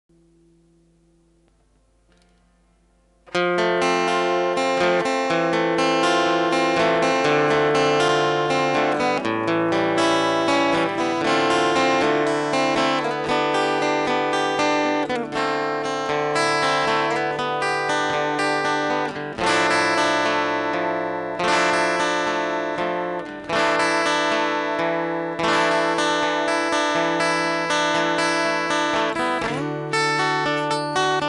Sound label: Acoustic